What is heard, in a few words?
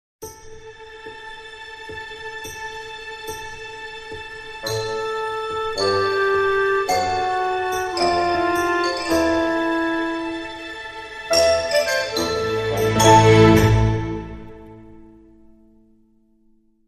Dramedy Sting